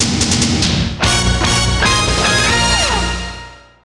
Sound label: Complete; Wario Master of Disguise